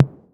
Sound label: 808 SOFT; Wizoo - Platinum 24 Electronic